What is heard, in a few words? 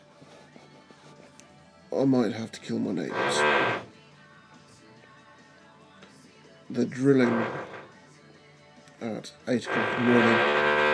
annoying neighbours